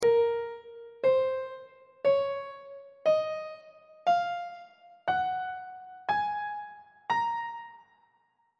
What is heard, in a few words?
b flat aeolian mode on treble clef